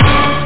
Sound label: weird hit